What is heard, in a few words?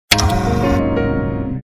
Open, Event sounds; LG KF900 Prada II